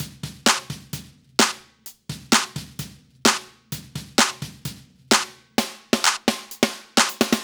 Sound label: 129BPM Hiphouse Kit